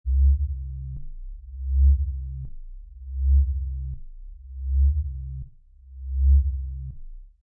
Reactor, Very low pulsating rumble of an alien craft, a starship or a flying saucer; Science Fiction Sound Effects